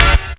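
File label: chord, Stabs; E Lab; XTc Files of House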